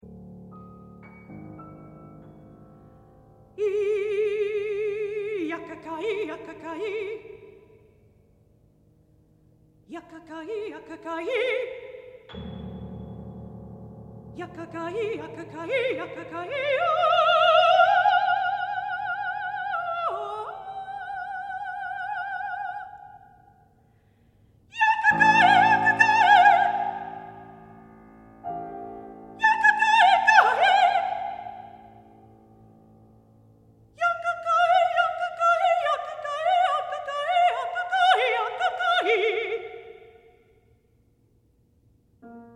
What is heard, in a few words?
bird cadenza